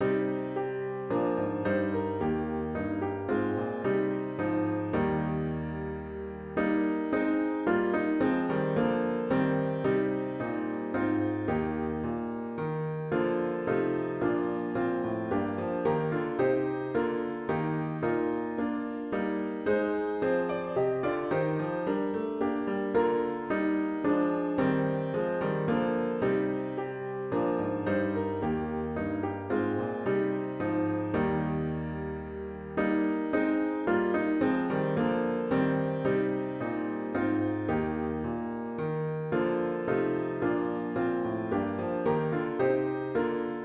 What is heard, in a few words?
Be Thou My Vision piano with Intro; worship service